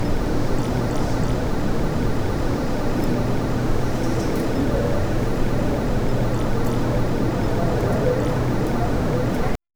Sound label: apartment